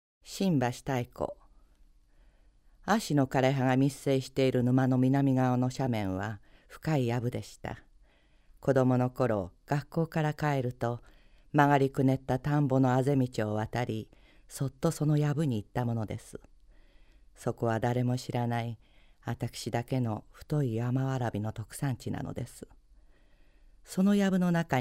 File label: shinbashi taiko